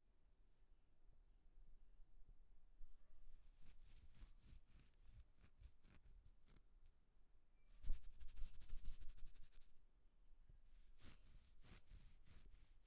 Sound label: Scratching Head